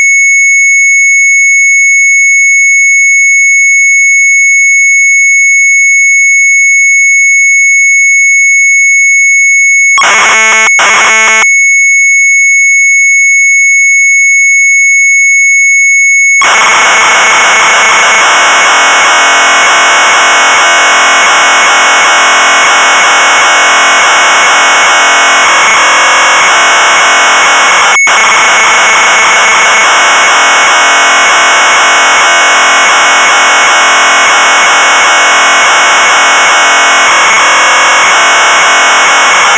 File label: Sharp MZ800 Tape; retromelsarchive